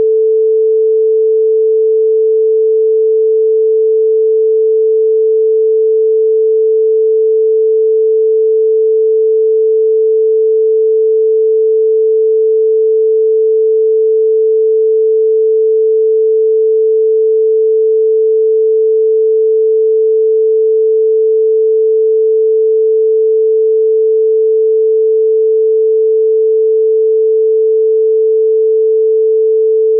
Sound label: 440hz